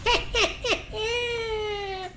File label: hehehehehe